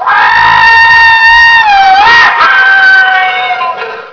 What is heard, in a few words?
slim yell